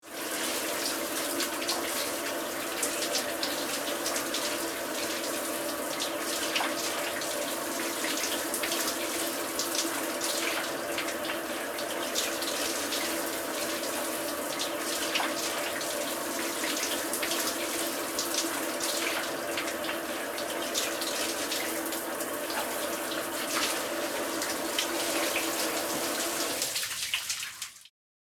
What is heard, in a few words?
Shower running bathroom distant perspective